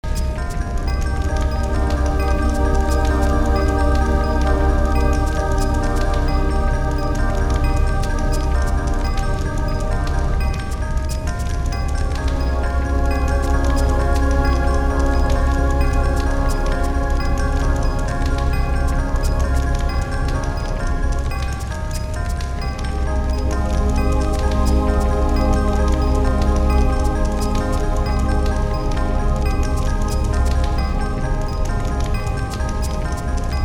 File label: Cave; Shenmue 2